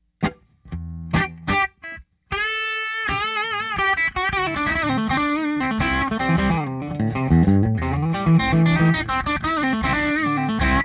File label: Bridge Pup